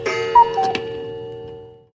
Cuckoo Half Hour

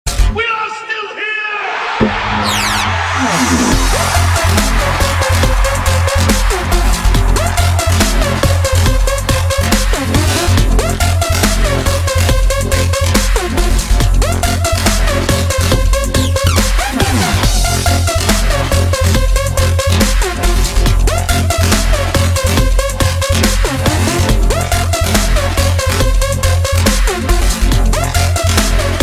dubstep machines

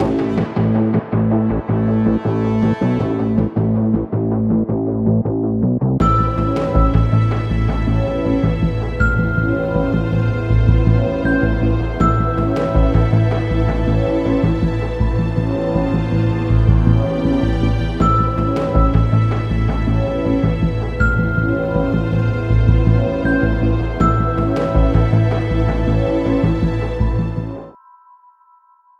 Film Music

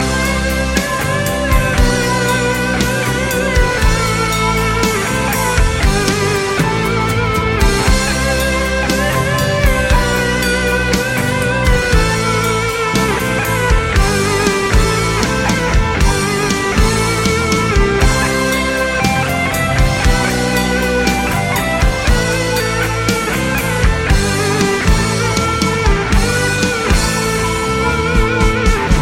guitar; blues

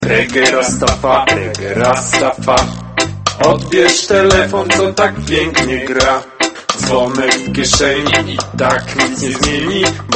regetone; reggae

